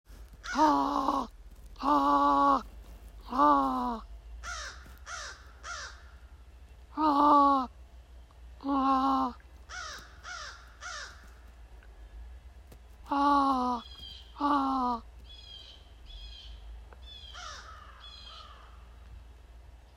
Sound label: calling crows